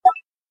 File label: click short confirm; Stargvze v2